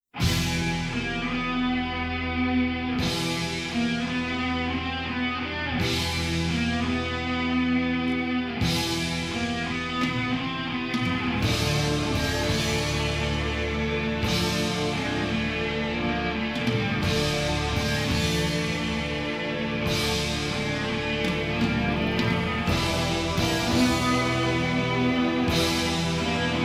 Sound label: metal trance